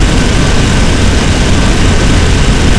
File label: fire; laika; tf2classic